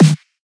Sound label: warm snare one shot D# key, Royality free acoustic snare sound tuned to the D# note.; snare drum samples; one shots